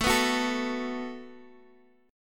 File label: A 6th Suspended 2nd, guitar